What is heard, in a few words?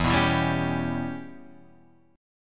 Db Minor 7th Flat 5th E, chord